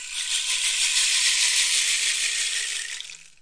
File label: rainstick performance; ETHNIC and WORLD PERCUSSION; Rainsticks